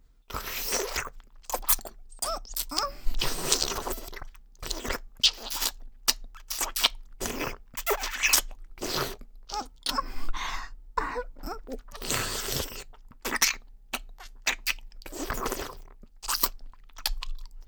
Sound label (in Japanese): キス(ディープキス)